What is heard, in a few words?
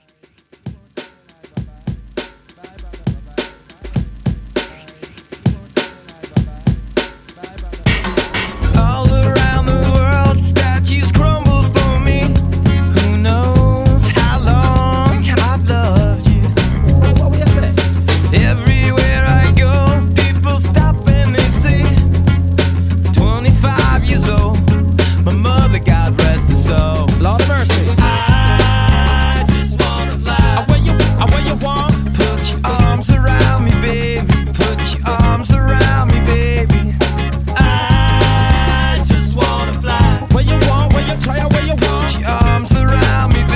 90s Rock; DJ Intro Outro Edit